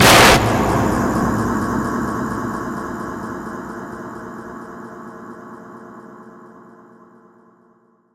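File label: sounds of scary voices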